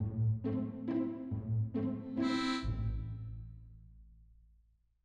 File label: bumper; kaffi kringle